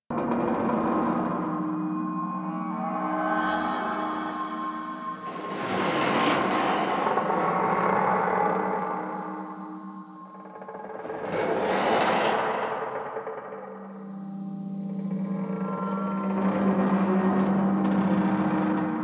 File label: Exterior Metal Creak Small Loop; Warframe; Ambience; Duviri